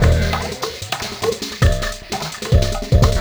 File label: Beat, Drum N Bass